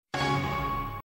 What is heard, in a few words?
Nintendo Wii Logo